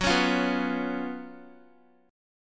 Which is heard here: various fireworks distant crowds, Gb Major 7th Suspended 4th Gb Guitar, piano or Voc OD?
Gb Major 7th Suspended 4th Gb Guitar